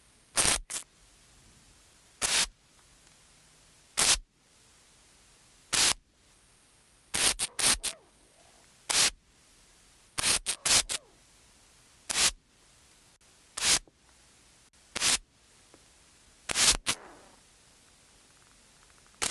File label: water spray; mono; 8040; Sonniss GDC